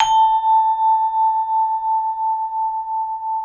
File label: MLT VIBE